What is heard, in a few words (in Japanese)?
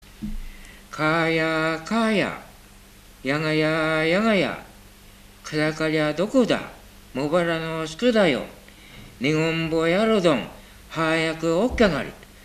warabeuta